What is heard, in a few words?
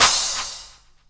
CLAPS HOU; Best Service Dance Mega Drums